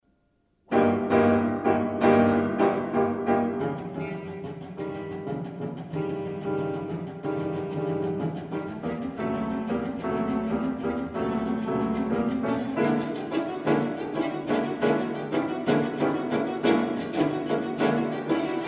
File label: Trio Minore